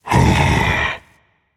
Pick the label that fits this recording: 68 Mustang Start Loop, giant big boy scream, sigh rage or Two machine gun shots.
sigh rage